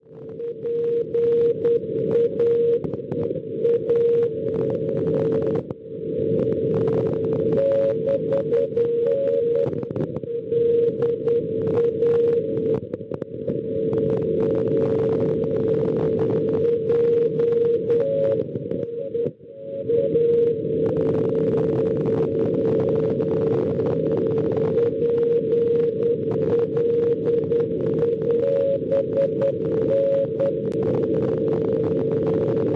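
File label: non-directional beacon 353 kHz; BN; Basle; Francia; Schwarzsee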